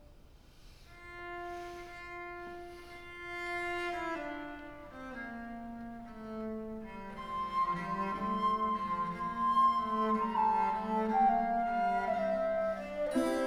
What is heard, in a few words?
Ensemble